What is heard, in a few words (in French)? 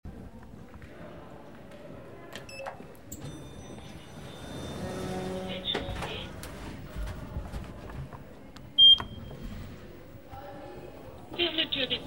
ASCENSEUR FERMETURE PORTES